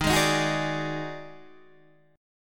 D sharp Minor Major 7th Double Flat 5th D sharp Guitar DADGAD